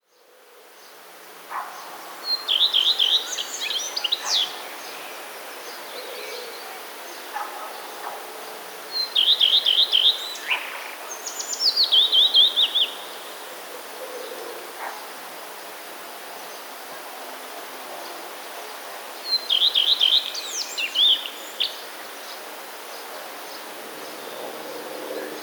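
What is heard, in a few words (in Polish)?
pleszka, śpiew pleszki, której piosenki zawierają elementy bardzo podobne do śpiewu piecuszka i dzwońca; wroblowe; zapozyczenia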